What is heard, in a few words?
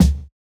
Kick, jungle breaks drum sounds